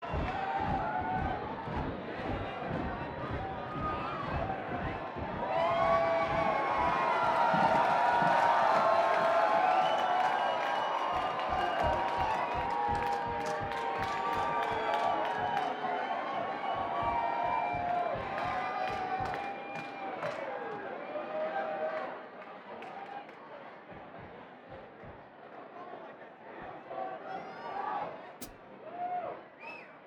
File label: Banter Crowd